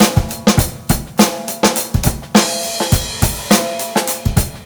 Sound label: BEAT, Roots Funk; USB Soundscan vol.41 - Roots Funk; AKAI